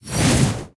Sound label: Magic; mighty party 2017 gamerip pc ios android; SFX Fight Magic Green Ball